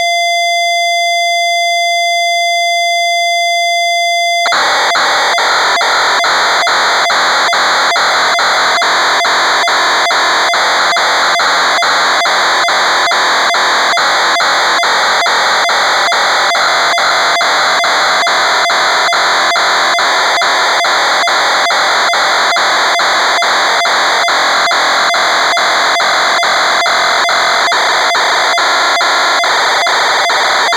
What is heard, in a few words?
SPLAT